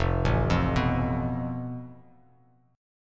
F Major Flat 5th, Bass Standard; FMb5 arpeggiated; JGuitar

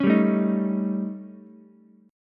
D sharp 7th Flat 5th, D sharp, down, Ukulele; strummed; JGuitar

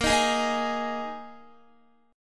Bb 7th Suspended 4th Bb Guitar 4F,4A,5C,5G,5C,6E; strummed

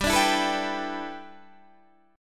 G 6th Add 9th G Guitar DADGAD, chord

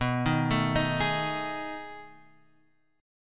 B 13th B, chord

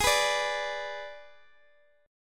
Ab Diminished, Guitar, Drop D; x,x,x,13,12,10

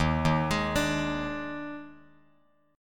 Eb Major 7th Suspended 4th Eb, Bass Standard; arpeggiated; 11,6,18,19; EbM7sus4

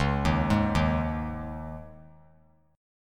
Db Suspended 2nd 3C,3F,3A,3D; arpeggiated; Dbsus2; JGuitar

